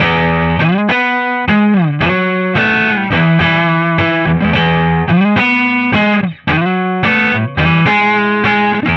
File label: Rock, Guitars